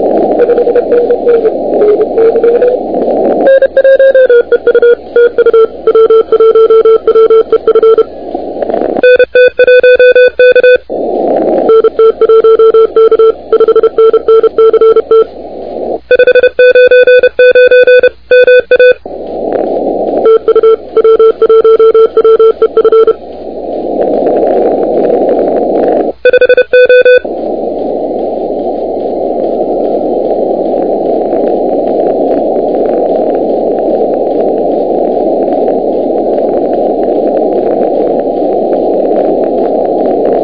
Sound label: CW 28MHz; ARRL10M